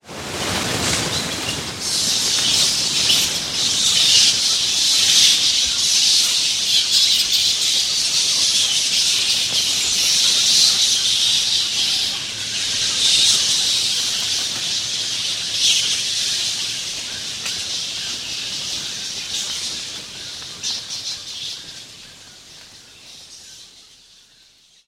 tieng ca dan doi lao loan trong hang

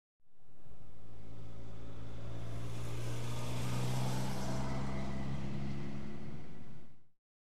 am thanh tieng lai xe tren duong

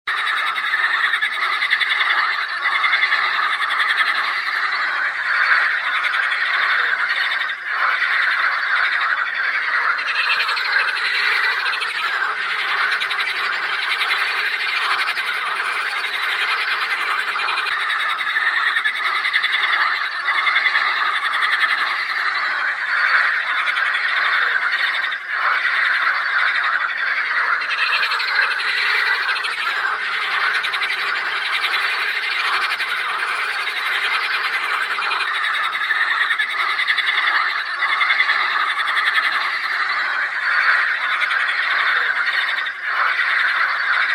dam lay day ech

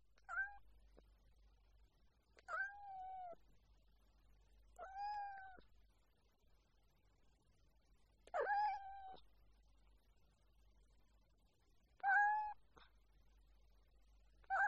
tieng meo keu nhe nhang khi tam trang vui ve

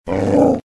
tieng gam cua mot con cho

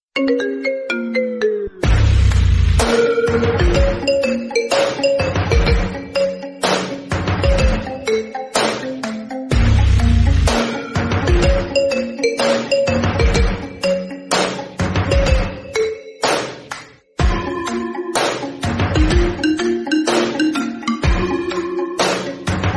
nhac chuong dien thoai iphone marimba

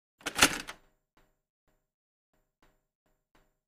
bỏ cuộn băng cát sét vào máy

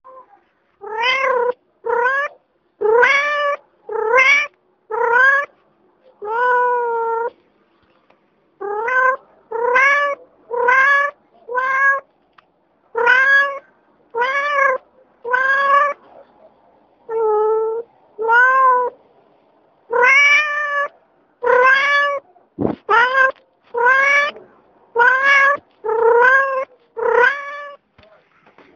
tieng keu nhanh cua mot chu meo ngoan meow

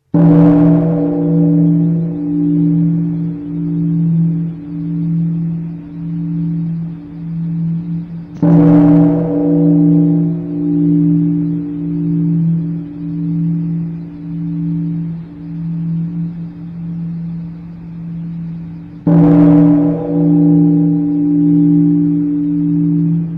tieng chuong chua

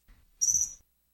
tieng doi rit keu

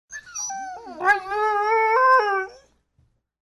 chú chó buồn nó nhớ chủ

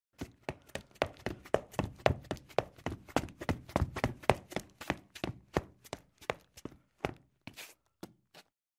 âm thanh tiếng bước chân chạy bộ 3D chân thật

